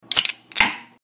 beer can opening sound

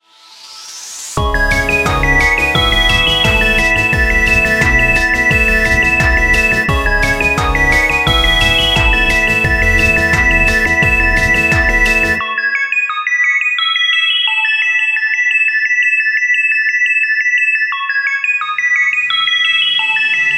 video game ringtone

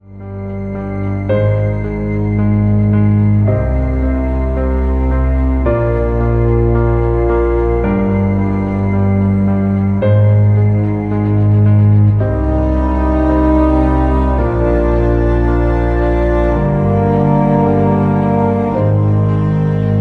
heart touching love ringtone

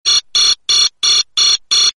Alarm Quick Bell Ringtone

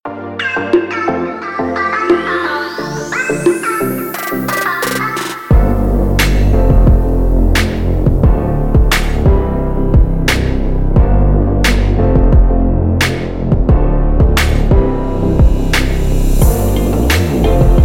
flames ringtone

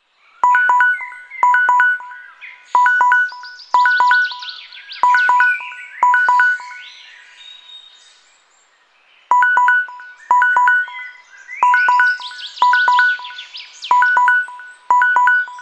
tic tac alarm ringtone with birds song

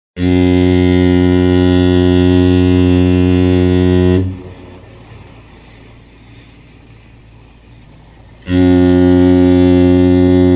fog horn sound

